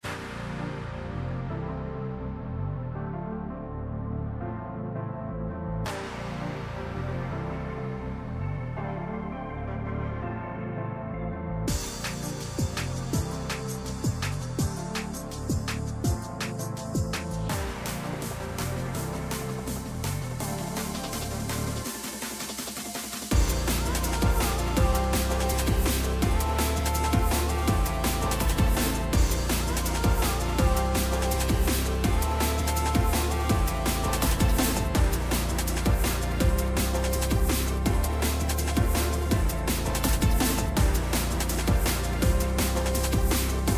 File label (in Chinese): 战斗; BGM